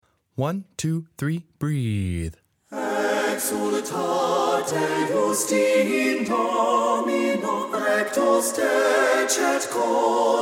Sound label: exultate justi in domino soprano, choirs